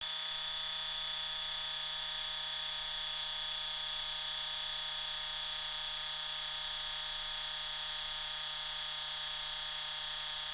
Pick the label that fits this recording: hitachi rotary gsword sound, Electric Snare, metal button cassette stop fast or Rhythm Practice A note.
hitachi rotary gsword sound